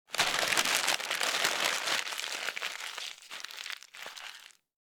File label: paper rustle